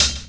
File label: Hihat; Sonic Foundry (Sony Creative Software) - Stylus Pressure Urban Grooves on Digital Wax; Low Rise